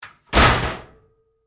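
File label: Door Locker Close Close Up Hard, Metal Door; Doors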